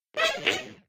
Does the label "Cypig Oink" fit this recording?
Yes